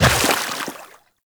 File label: Run Water, Footsteps; Warframe; Tenno